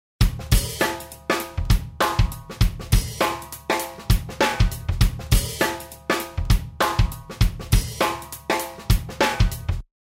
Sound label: drum